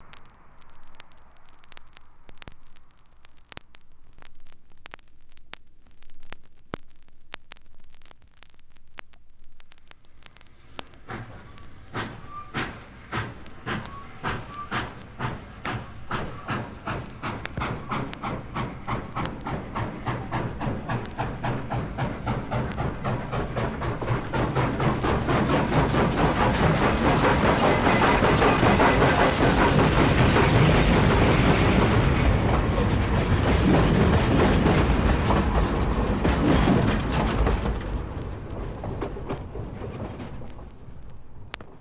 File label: Steam Engine (Short Train)